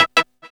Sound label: PULSE E; AMG; Global Trance Mission VOL-1